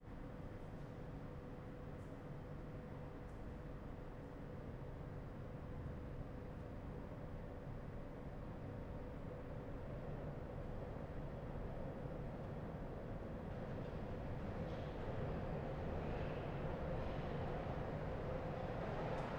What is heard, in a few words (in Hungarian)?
a szerelvény után léghuzat, metró; varoskapu